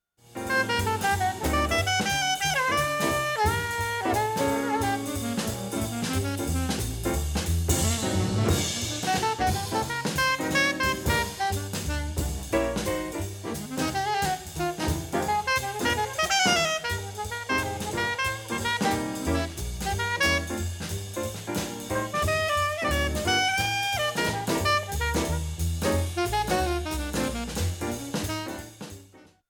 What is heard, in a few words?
jazz